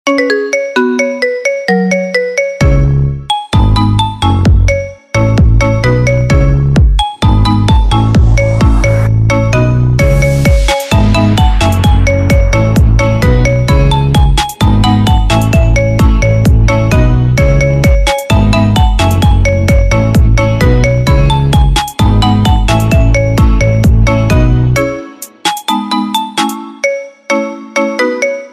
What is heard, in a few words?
iPhone X Marimba Remix; dzwonki na telefon